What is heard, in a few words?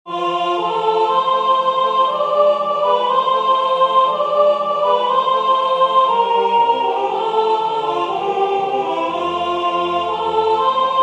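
Rocking Carol soprano; Christmas; Waiheke Island Choral Society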